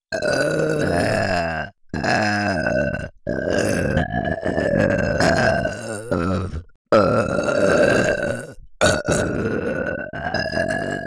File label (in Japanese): 左右交互の 連続 ゲップ